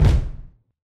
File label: dog day stomp; dognap